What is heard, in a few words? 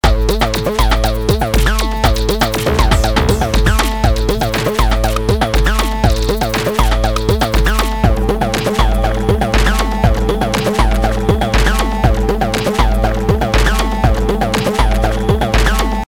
E-Drums, Electronic Kit; Zynaptiq Unmix Drums; Toontracks EZdrummer